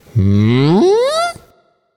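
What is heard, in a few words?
thinking, nonverbal; smite; voicepack; khepri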